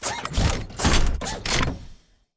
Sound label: backpedal to combat; Titanfall 2